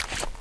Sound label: m4a1 deploy, weapons